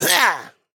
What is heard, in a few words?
Giant Rat Attack